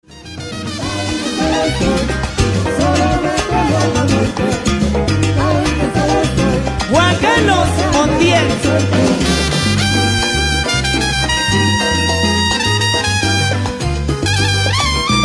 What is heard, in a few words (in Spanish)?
Trompeta Duelo